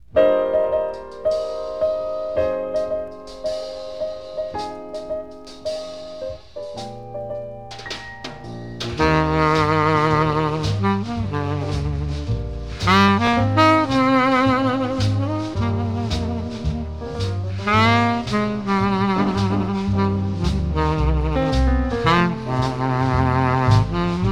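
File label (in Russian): saxofona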